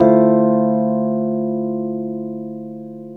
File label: CHORD, 150 BPM; Ueberschall; Drum & Bass Flash Trackz